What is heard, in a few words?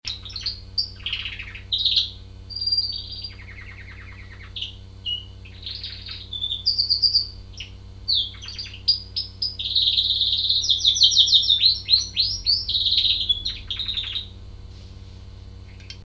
song, Birds